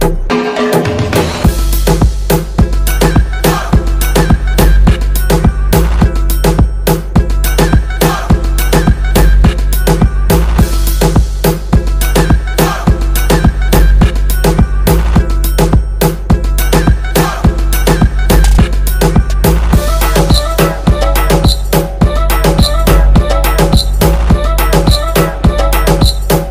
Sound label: song instrumental; pati patni aur woh movie